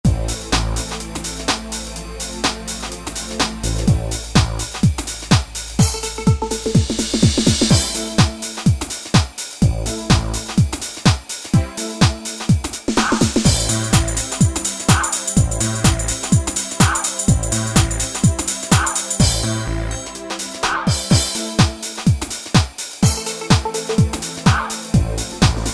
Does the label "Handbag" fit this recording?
Yes